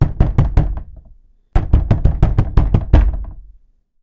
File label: knock on Fusuma; knocking